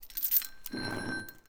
Footsteps Chain

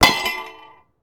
Metal; game inn

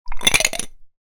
Putting ice into glass

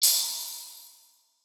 ice magic small; 魔法効果音

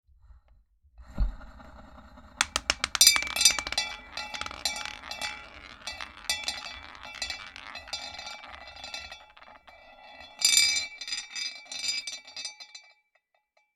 The roulette wheel spins; board game casino mahjong

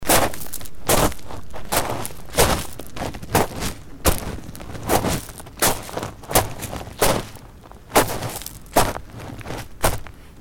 footsteps gravel walk

